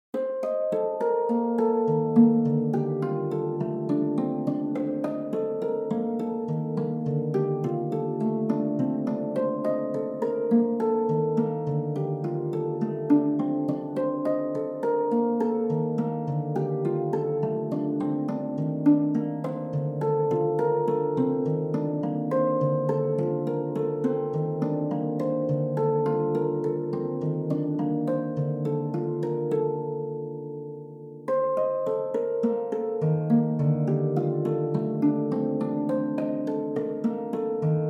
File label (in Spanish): arpa